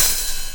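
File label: HH open hi-hat, Fresh Disco House; USB Soundscan vol.20 - Fresh Disco House I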